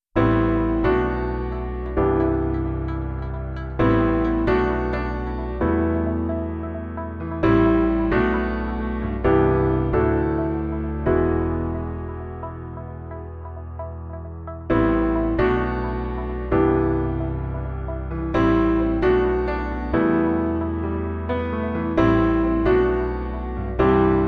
Move No Drums

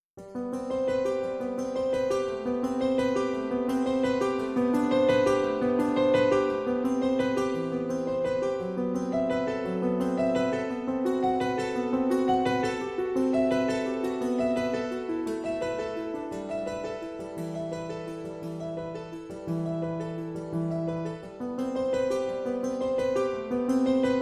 Waterfall Piano and Dulcimer

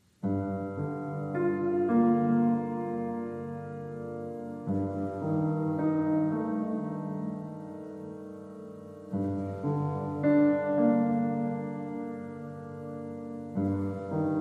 Piano & Clarinet

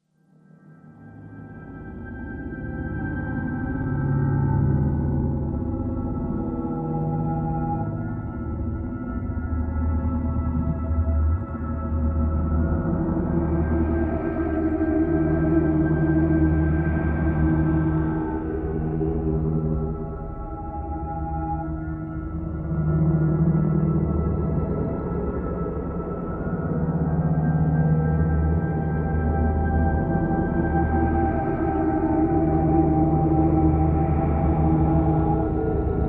Threatening Gloom

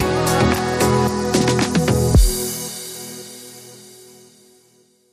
Bumper

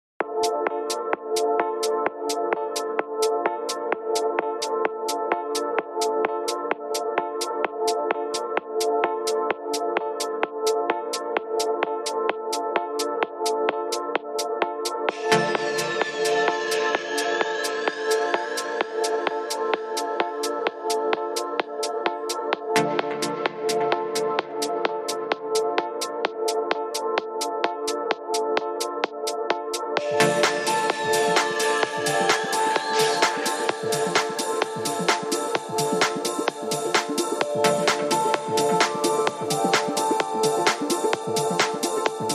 No Bass

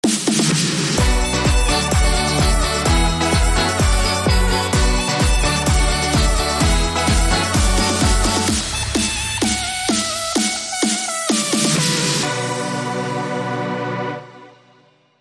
Electro Pop Diva

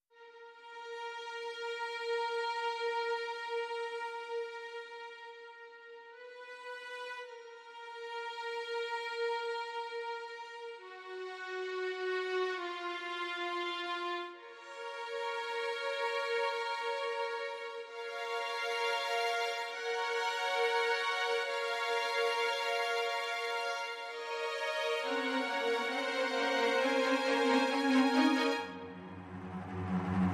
Strings Only

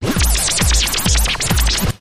Swishy Thumper Groove

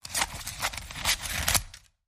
Cutting Wrapping

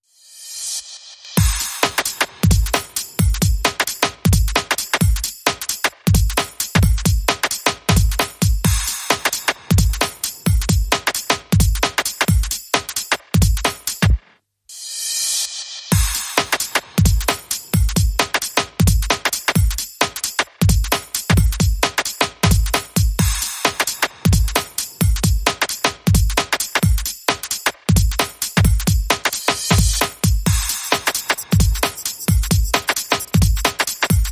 Drums Only

